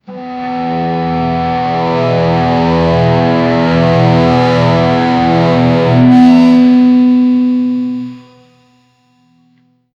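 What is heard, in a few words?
robot deploy instant electric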